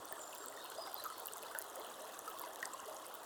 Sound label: Waterway G Light